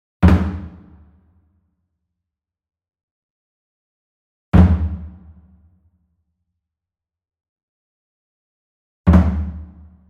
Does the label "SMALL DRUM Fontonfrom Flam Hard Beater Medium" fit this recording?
Yes